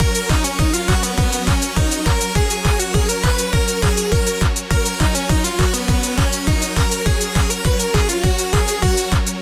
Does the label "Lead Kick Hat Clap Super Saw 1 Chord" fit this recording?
Yes